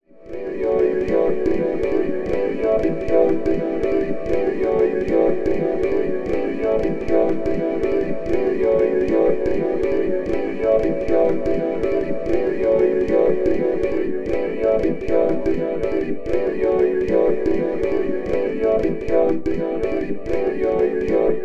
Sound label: AKAI MPC LIVE II Insert Talk Box Delay Chorus Reverb